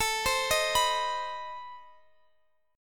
C Minor Major 7th, A, guitar chord

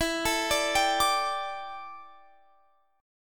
D Suspended 2nd Suspended 4th E

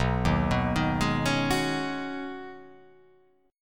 B 6th Add 9th B down, chord

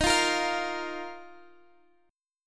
D sharp Suspended 2nd; chord shape

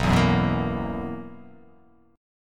B Major 7th Suspended 4th, B, down

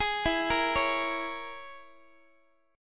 E 6th Flat 5th, down, Guitar; E6b5; arpeggiated; x,x,11,14,14,12

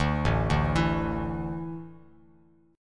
A Suspended 4th, Bass Standard; Asus4 arpeggiated; JGuitar

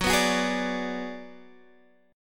Ab Minor 7th Flat 5th Gb

